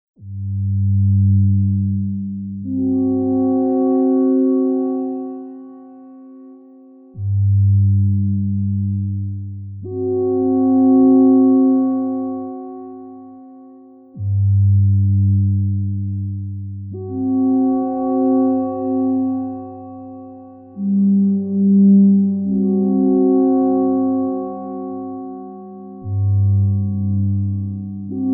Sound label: ambient